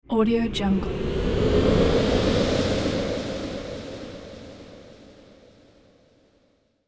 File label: future teleport